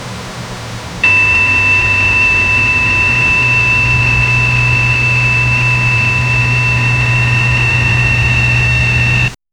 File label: diesel engine wiper squea